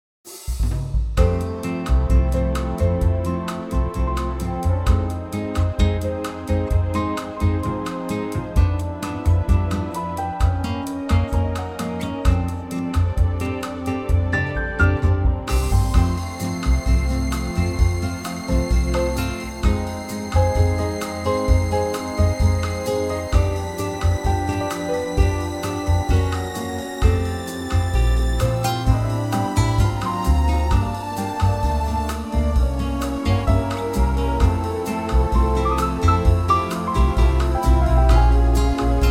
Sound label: latin F; vocal range - F to A